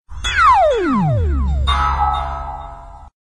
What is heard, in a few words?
space bounce sms